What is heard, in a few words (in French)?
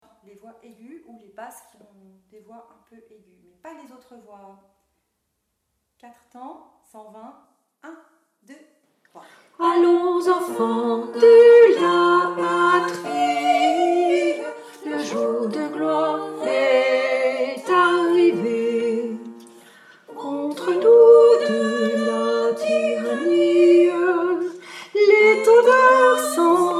la marseillaise tutti